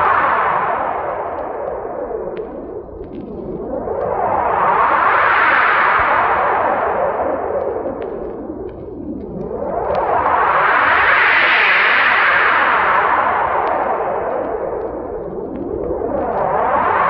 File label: spacey whirs looped; Rumbles